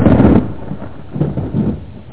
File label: storm